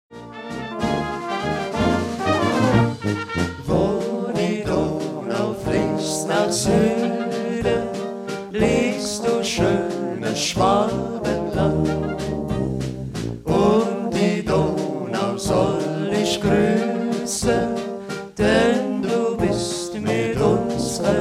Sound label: Waltz, Brass Band; Donauschwaben